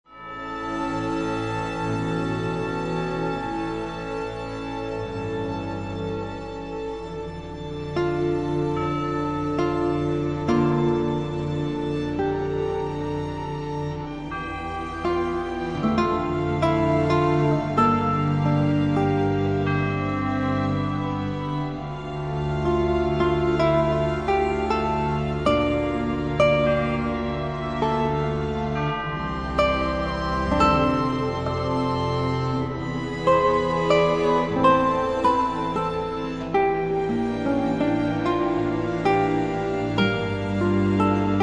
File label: Concerto for guitar